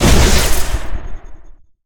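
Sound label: Explosion Medium Electrical; Destruction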